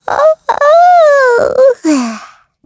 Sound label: Yawn; Gremory; Kings Raid